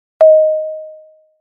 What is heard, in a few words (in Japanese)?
飛行機内アナウンスサイン